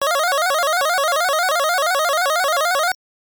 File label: Name Input Completed; Oretachi Gesen Zoku; BurgerTime Music CD